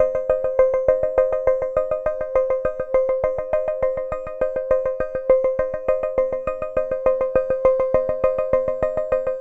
fast music; mines3d

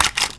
Click

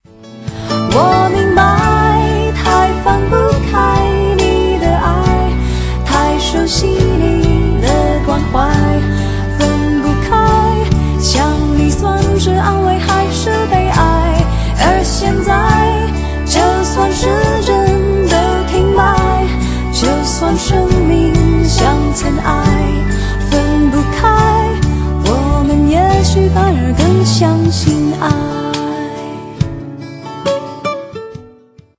music; 12306 ticket helper

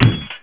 blade

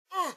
Player Jump; Platformer2D

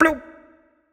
Plew Verb; BattleCats

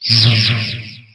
teleport

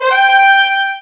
goal

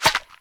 racket; tennix

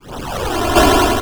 swap ok, laser; kokogems

